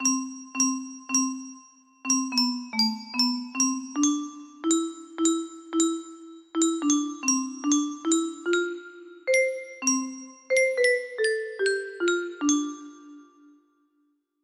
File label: basically a scale, music box melody